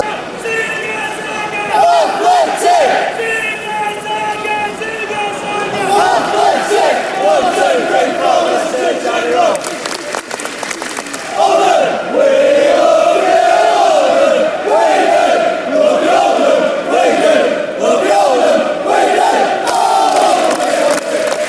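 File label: zigger zagger, football chant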